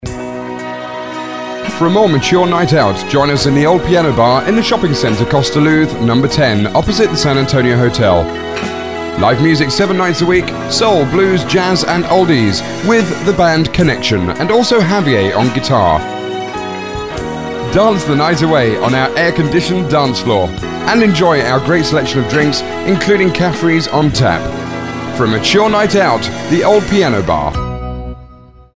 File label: piano bar